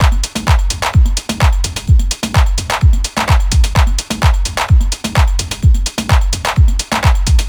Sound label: Beat Loop 128bpm